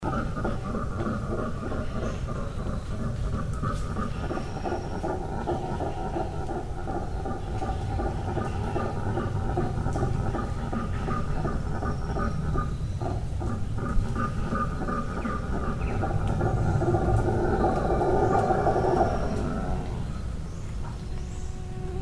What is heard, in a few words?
howler monkey in forest weird sound; recorded for first time